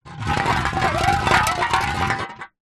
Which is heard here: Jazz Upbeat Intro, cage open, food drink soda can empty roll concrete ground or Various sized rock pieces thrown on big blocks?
food drink soda can empty roll concrete ground